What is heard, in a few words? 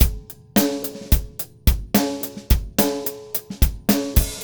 108 Latin Jazz Drums; Pro Tools Essential Loops; Digidesign